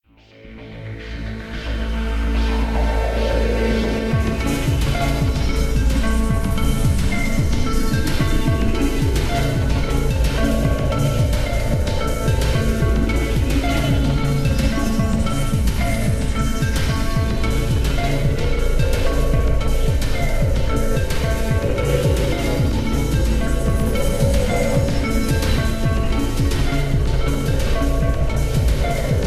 Club Theme

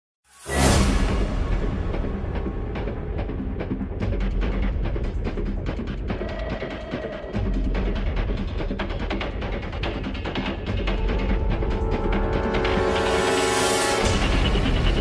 Suspense Theme

